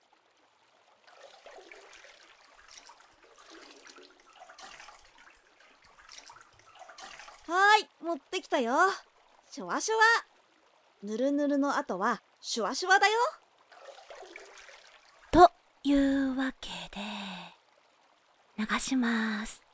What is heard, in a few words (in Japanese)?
しゅわしゅわ 炭酸水